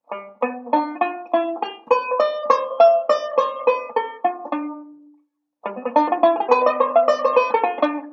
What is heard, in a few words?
ii V Pattern, banjo; Beyond Chord Melody Volume II